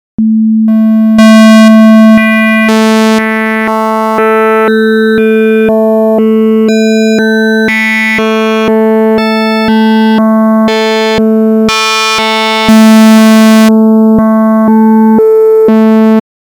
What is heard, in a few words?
evolution evs-1 32 waveforms